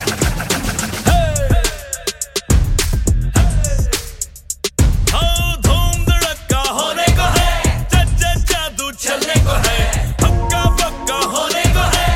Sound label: Whistle